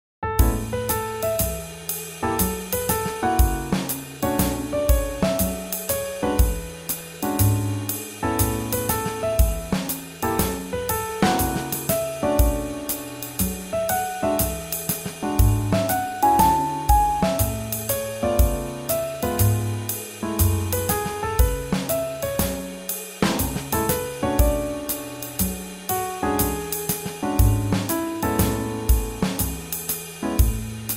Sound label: Swing Jazz